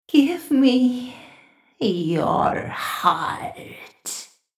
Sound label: female horror voice siren